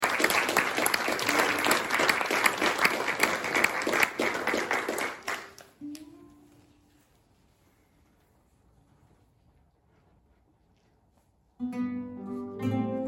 guitar ensemble

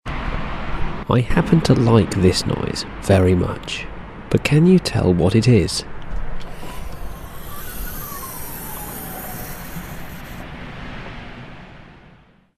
outdoor

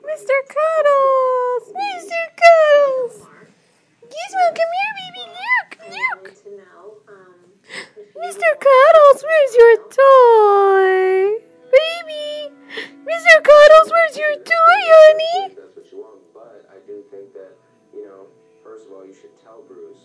yeeeeee

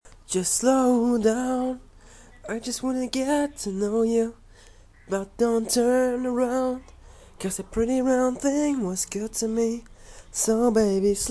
me singin

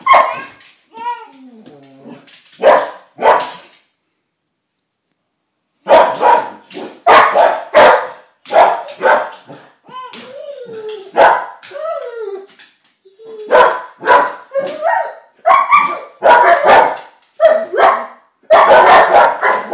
woofy boo

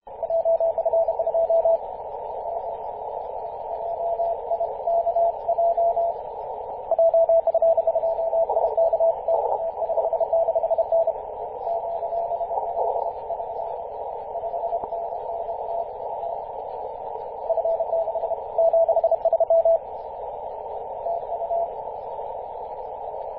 14.014 MHz cw; su9vb

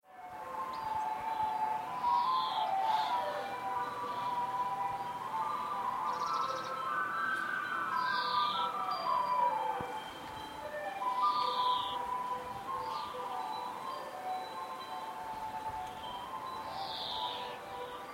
ice cream van passing by

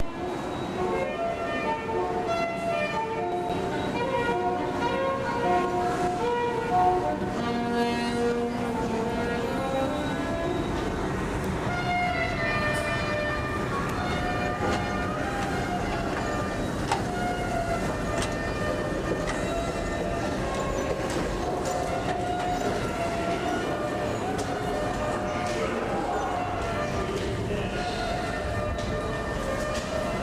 times square subway station

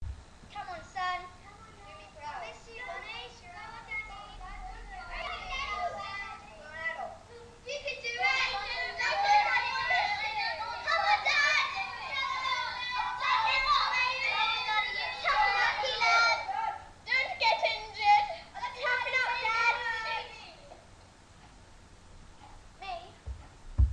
crowd

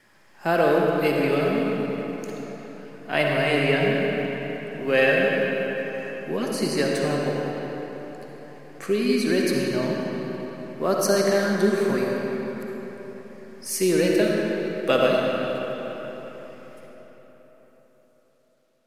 voices cave

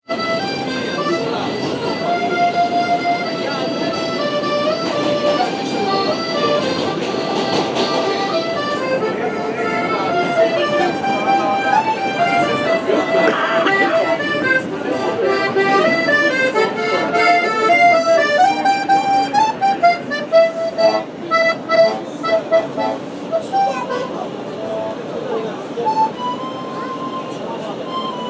nyc subway christmas accordion

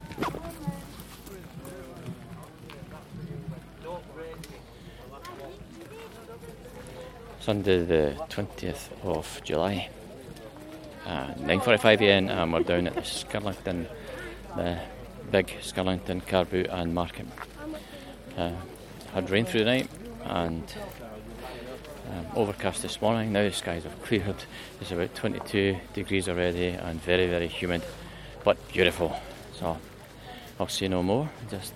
soundscape from skirlington car boot market

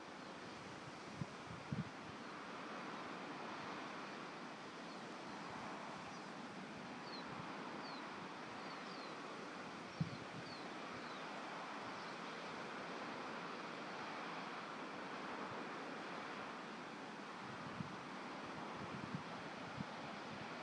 wind in trees

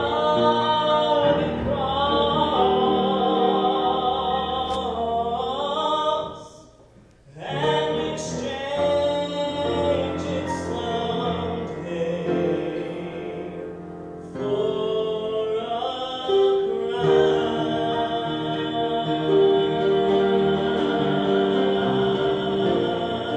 worship service; fbc adel

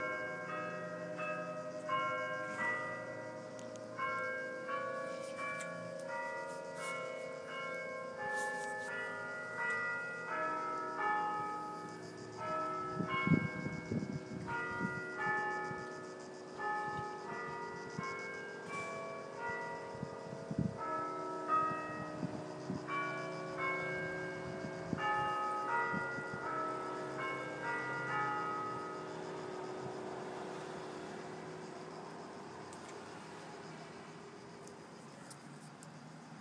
Sunday midday church bells

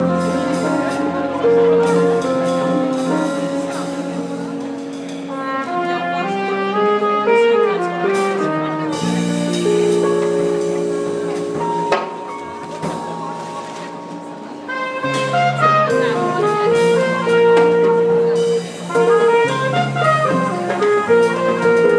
lunchtime jazz

